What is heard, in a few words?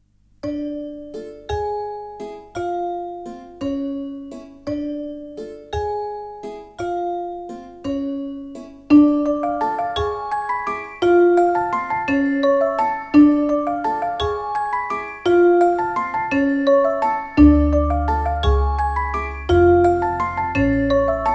Sneaky Suspicions Underscore, Dramedy, orchestral comic drama; mischievous orchestral comic drama; Narrative Mind